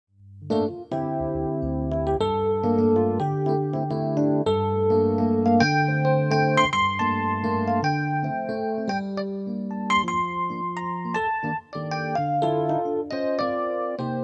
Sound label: E Piano Tine; V3 Sonority